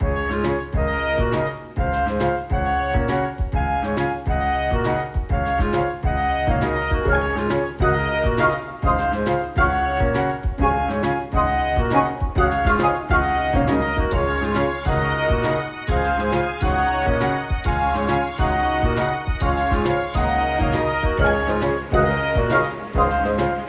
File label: Cheerful Interlude; M&LPJ